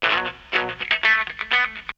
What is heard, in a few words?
Guitar; ZERO G - Datafile DISC 2